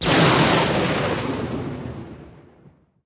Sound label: Super Slingshot; Angry Birds